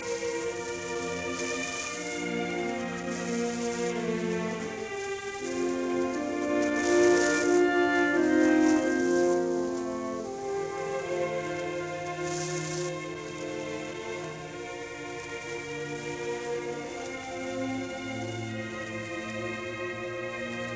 Symphony in D minor Choral Adagio molto e cantabile Andante moderato; Op 125